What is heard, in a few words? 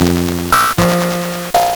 Nintendo Gameboy LSDJ